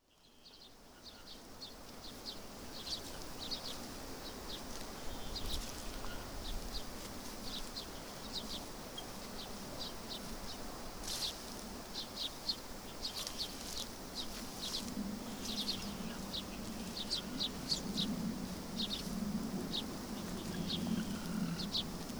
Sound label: Soundscape; Wallog